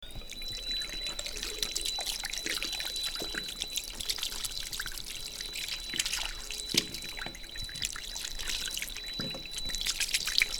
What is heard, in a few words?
Washing hands in sink